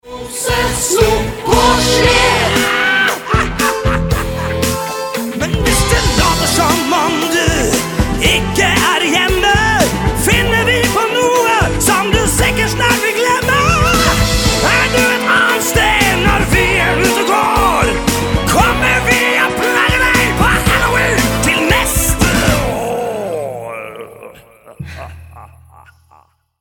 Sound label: Halloween GLAM